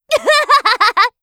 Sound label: laugh; Eternal Return; Hisui; KOR